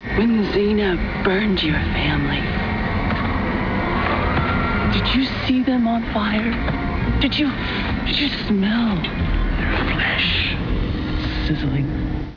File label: sizzling